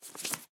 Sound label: wood tile drop